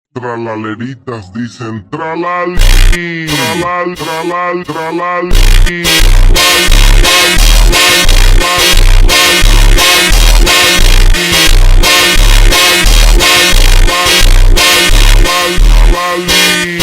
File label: funk slowed reverb